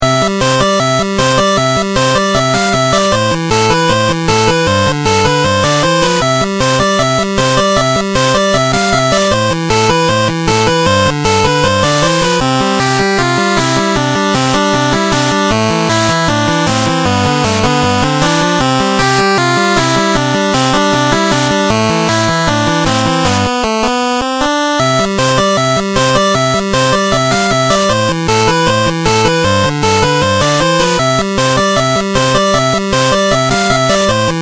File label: music